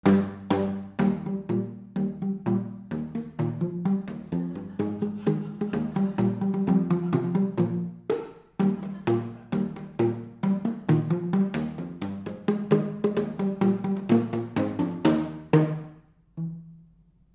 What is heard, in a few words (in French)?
musique, instruments insolites